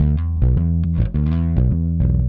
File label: Clinical Bass; Sonic Foundry (Sony Creative Software); Indie Rock Anthems; Session 02 - Clinical